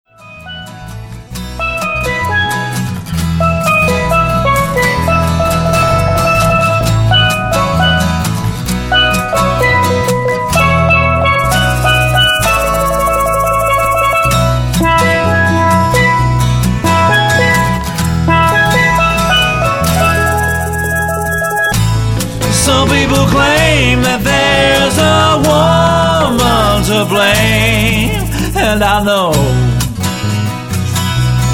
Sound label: jazz; Koka